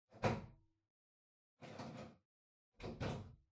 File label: Ceiling Light Far Tube Remove